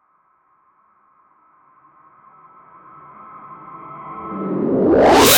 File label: reverse video sound effec